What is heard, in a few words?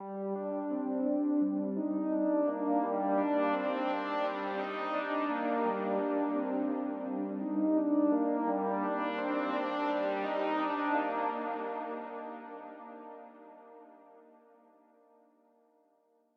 melody; one dog story